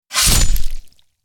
Sword Impact

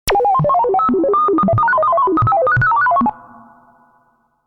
Electro Beep Accent

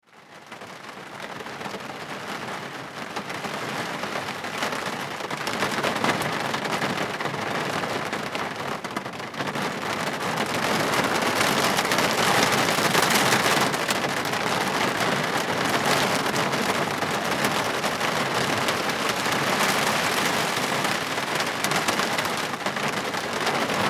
Rain Auto

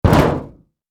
Impact Auto Hood

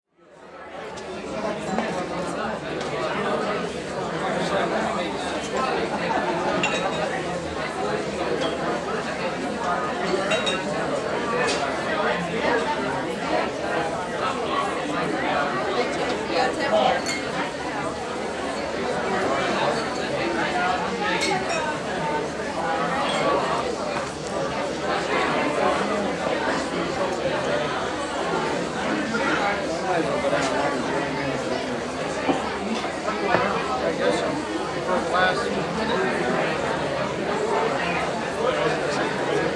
Crowd Walla Bar

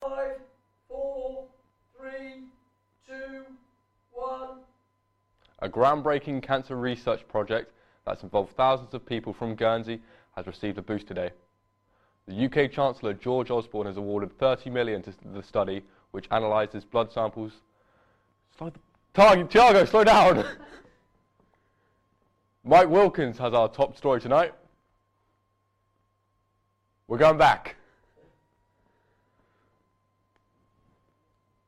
Studio Recordings

